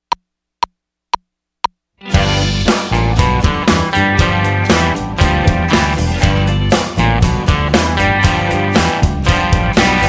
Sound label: G Blues